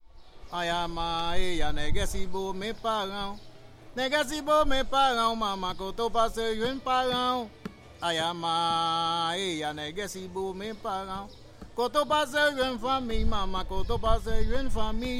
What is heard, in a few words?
merengue